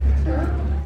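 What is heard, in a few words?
sea lion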